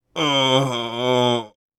Grunt

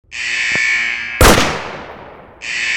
Sniper + Crossbow; Fleeing The Complex

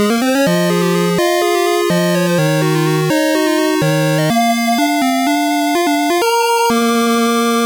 Level Clear; Indiana Jones and the Last Crusade; Master System

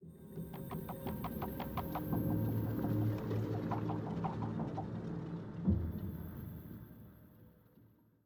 85bpm; Legends of Pegasus; windows gamerip 2012; Research High

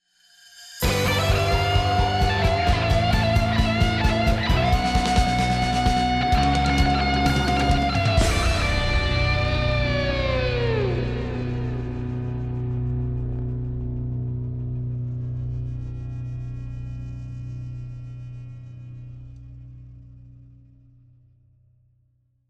Victory; apex legends season 12; Fuse

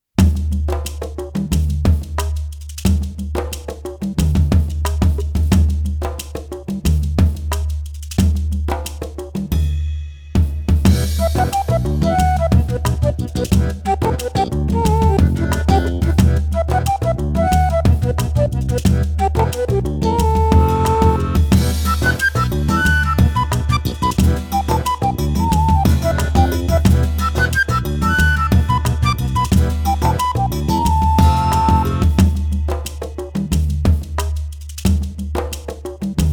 Funk; dragon fantasy book ii

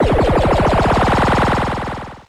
Signal Beam

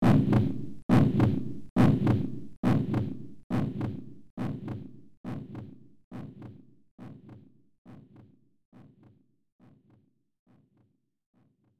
Heartbeat; Shiro Tokisada Amakusa 1

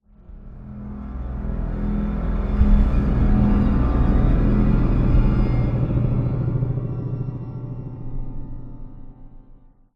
STRING PITCH DROP; Transformers Dark of the Moon The Game

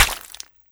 splat

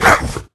Orcs Death

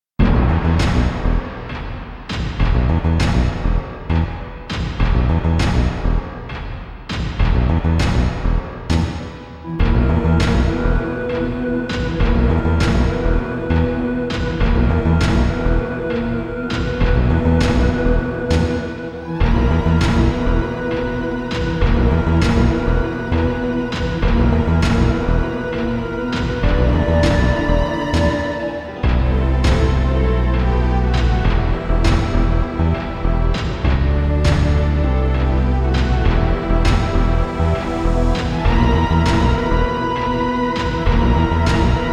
Metal; Elemental Gimmick Gear; Metal Heaven

